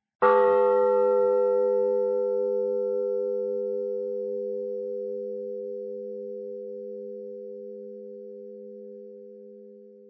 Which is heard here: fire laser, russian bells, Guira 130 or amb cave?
russian bells